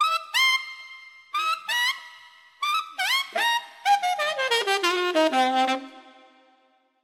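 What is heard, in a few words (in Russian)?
писклявое звучание верхних звуков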